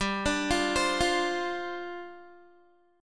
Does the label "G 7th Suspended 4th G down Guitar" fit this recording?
Yes